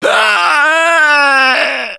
Death Scream, Survivor Voice; Gambler; russian

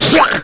smoker attack, voice; Left 4 Dead 2

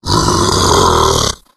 tank fail, voice, growl; Left 4 Dead 2

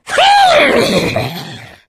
jockey death, voice; Left 4 Dead 2

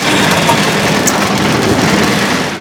production aircraft; Hearts of Iron IV Death or Dishonor

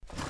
grenade throw; AssaultCube

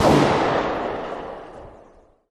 p90 distant, weapons; Counter-Strike Global Offensive; csgo

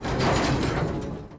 spaceship fortify; Sid Meier's Civilization 4 Complete; Beyond the Sword; Final Frontier; Units

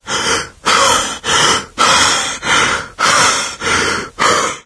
heavy breathing; Left 4 Dead 2; coach

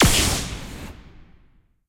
shoot; Alawar; Crusaders Of Space 2

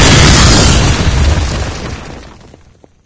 dig up; npc; Garry's Mod; hl2; antlion

